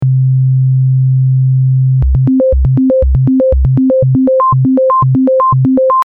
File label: sine